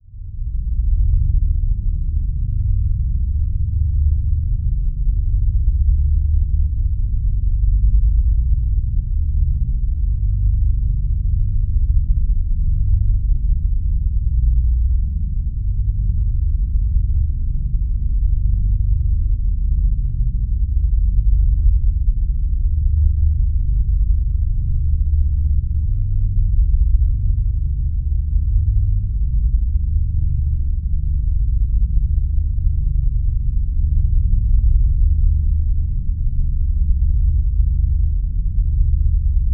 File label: Drone Subsonic Rumble Low Frequency; Production Element Imaging; AdobeCC